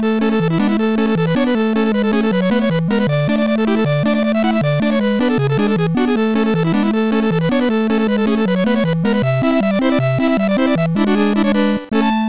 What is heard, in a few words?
tetris music; svencoop; sc tetris